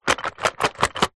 household makeup in pouch shake

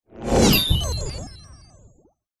science fiction robot burst

